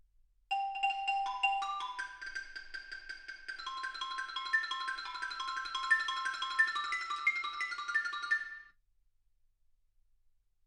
xylophone

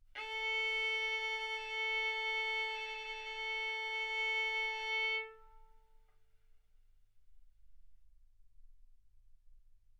Violin arco A4 B4; mf; sul A; stereo

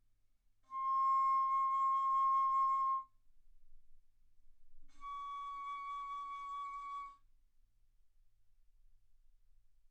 Bass Flute C6 Db6; ff; single mic

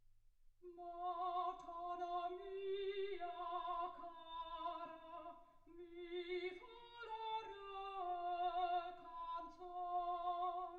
soprano

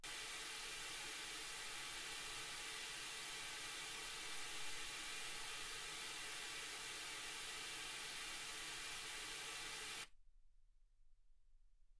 wgn

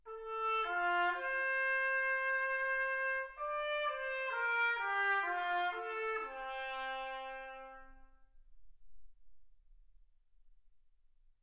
trumpet

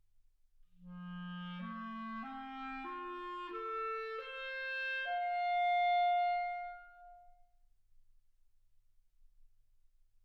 clarinet

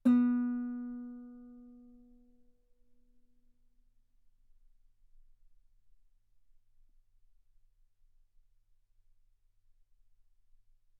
Guitar ff B3; sul B

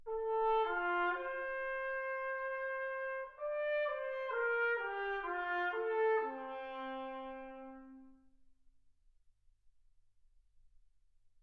trumpet

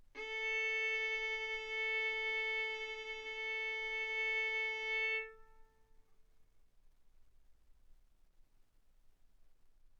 Violin arco mf sul A A4 B4 stereo

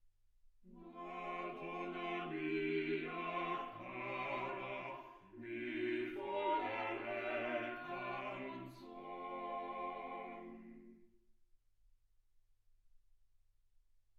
quartet

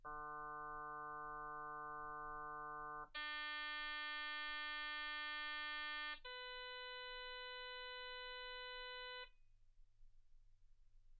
harm sinus 48kHz

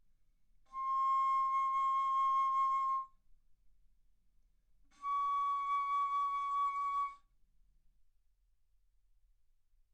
Bass Flute C6 Db6; ff

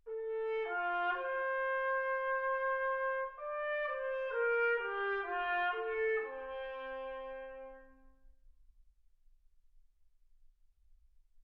trumpet

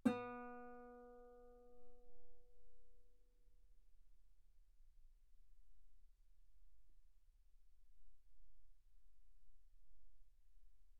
Guitar ff sul B B3

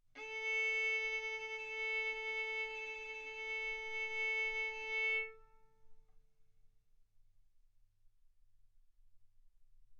Violin arco mf sul A A4 B4 stereo

